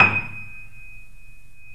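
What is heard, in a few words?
D#6, Bösendorfer, 3D Pianos; E-MU Producer Series Vol. 5; 3-D Audio Collection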